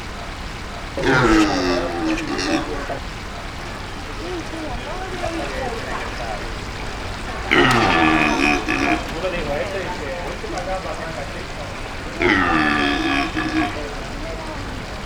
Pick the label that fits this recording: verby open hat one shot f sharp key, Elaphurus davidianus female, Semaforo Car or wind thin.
Elaphurus davidianus female